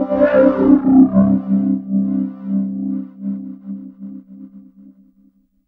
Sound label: SE OVERD., Overdrivin'